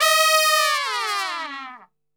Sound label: D#3, Brass Super Section, TRP; TRP LNGFLL; Best Service